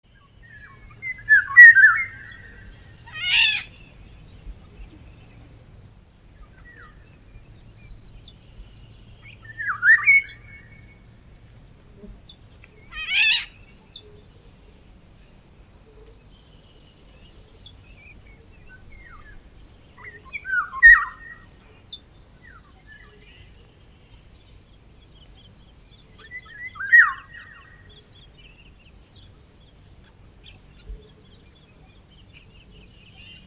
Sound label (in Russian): zvuki penija obyknovennoj ivolgi